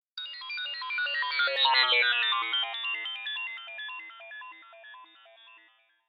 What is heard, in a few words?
doppler shifted ringtones iphone